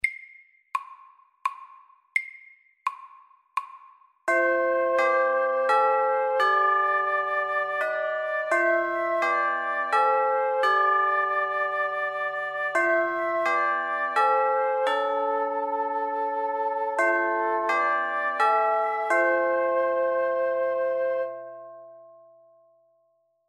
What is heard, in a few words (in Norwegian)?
Klokkekling sakte